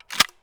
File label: mr153 slider up; Escape from Tarkov